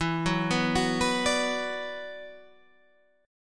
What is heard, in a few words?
E 7th Suspended 2nd, down